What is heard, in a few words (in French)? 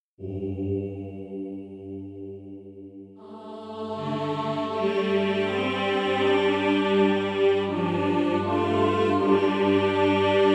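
prélude pentatonique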